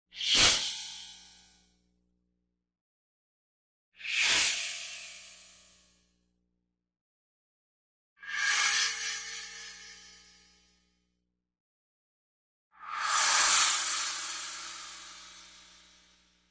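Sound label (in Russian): 4 звука ощущения мерзкой твари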